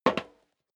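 step leather shoes metal dry single left, footsteps; schritte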